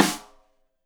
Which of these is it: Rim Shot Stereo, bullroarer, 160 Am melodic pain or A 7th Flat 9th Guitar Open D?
Rim Shot Stereo